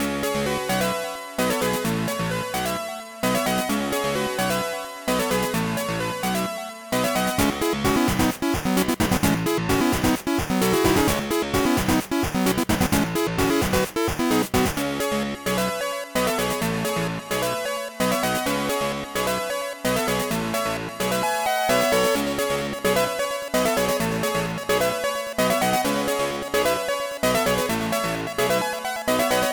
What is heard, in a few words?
overdrive